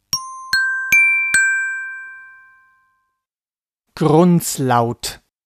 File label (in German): Grunzlaut